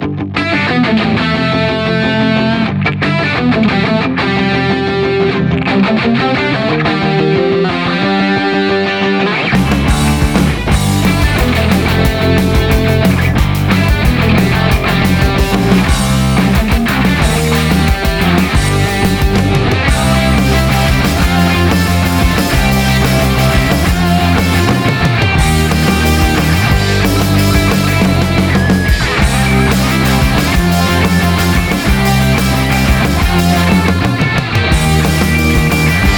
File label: Svet instrumental; СТС